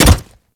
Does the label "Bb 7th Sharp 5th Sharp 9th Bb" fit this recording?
No